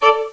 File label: Sfx Volume